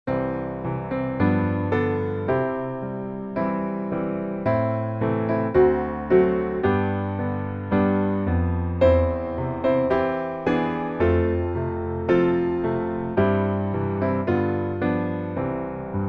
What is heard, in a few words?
piano